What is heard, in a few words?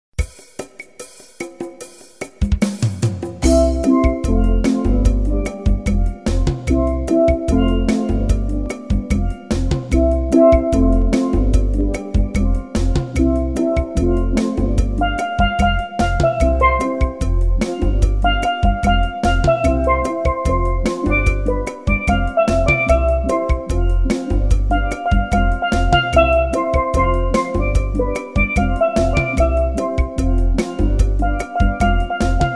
steel vibrations La Bamba Sample